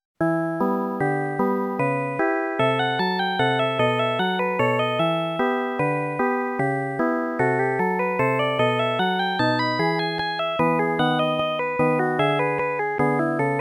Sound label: Minuet; dragon quest game music super collection vol.1